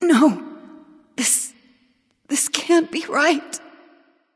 death; smite; pele

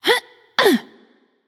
grunt jump light; smite; voicepack; hel

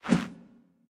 swing; smite; odin

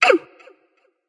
ouch light; smite; voicepack; hel

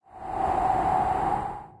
wind; smite; kukulkan

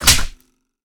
melee impact; smite; odin

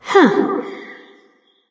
confused light; smite; voicepack; hel

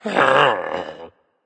sigh berserk; cuchulainn

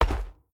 foot step; smite; zhongkui

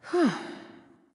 nv sigh; smite; awilix

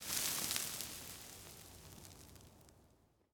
sandcastle sand; smite; god geb skin7

